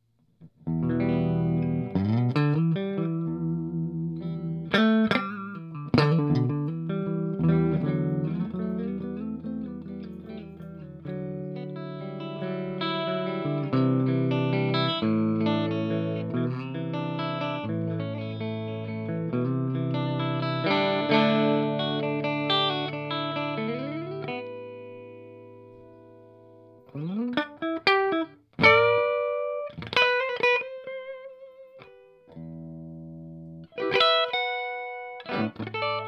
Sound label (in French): truebucker clair position int2